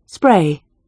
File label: spray